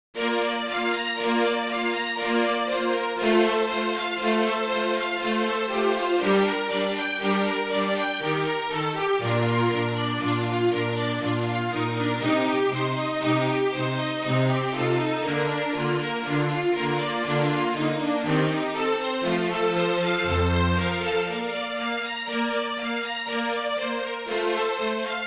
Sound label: Oboe, flute trio version; Adagio from Oboe Concerto Op.9 No.2 - Albinoni